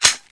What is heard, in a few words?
glock slide forward admire, weapons